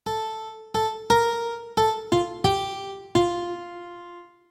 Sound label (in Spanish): clavicordio alto